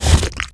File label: Wet Impact; Piglet; Neighbours From Hell Console Edition; GC, Xbox